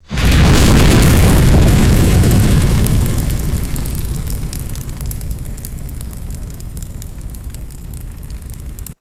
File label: roaring fire explosion and sustained burn